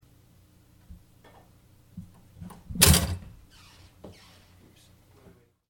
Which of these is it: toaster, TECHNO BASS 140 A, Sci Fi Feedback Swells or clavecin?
toaster